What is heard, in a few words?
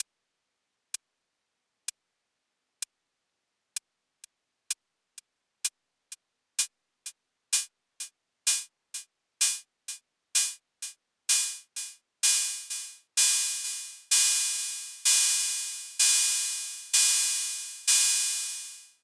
akai rhythm wolf hi-hat open